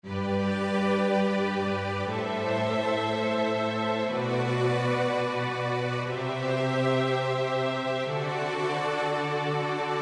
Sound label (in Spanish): progresion sol mayor